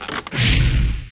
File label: airboat tree attack